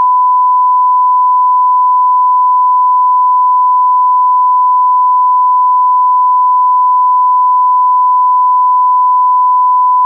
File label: Sine 1000Hz -5dBFS